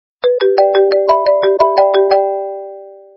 iphone 12 mini lite

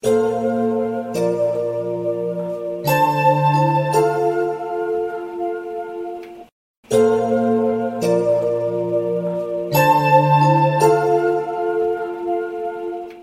Whatsapp Sound

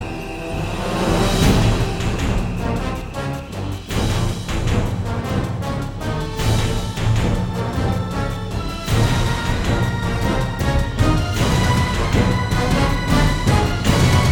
death swell

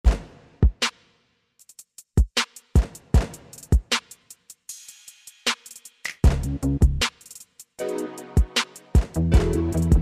galaxy remix

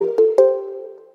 samsung galaxy s21 ultra; Sonnerie

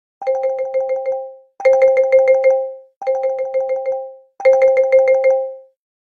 stock; google pixel 6

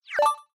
xiaomi shrink ray

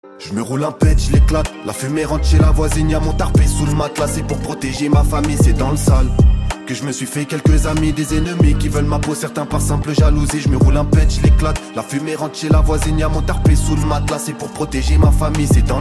boombap

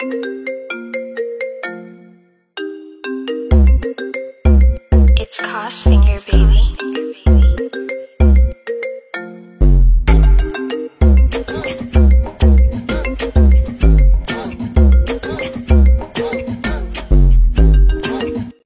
brazil; iphone

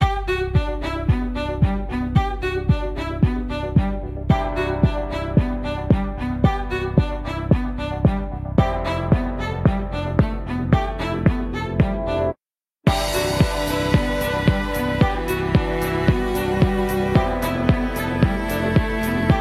samsung s21 smooth evening

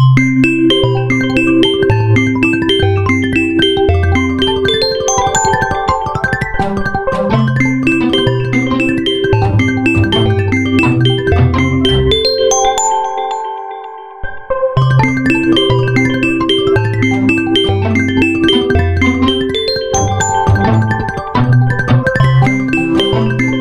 carousel; samsung a90

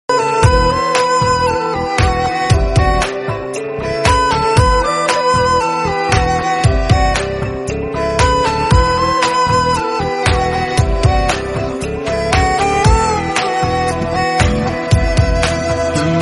piano guitar mix

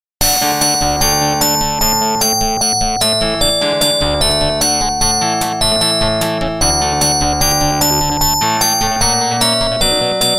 Samsung A800

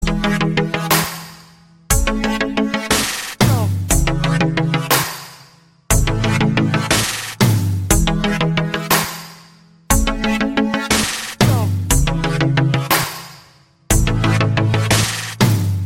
Samsung Galaxy J7 Pro

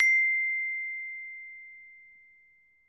message

